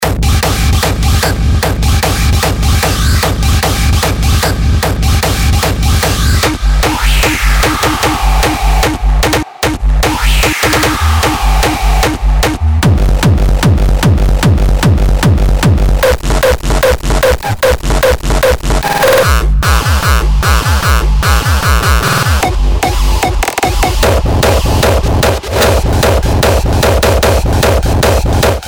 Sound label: hardstyle kicks